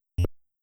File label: Beep; mother1